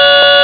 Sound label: beep beep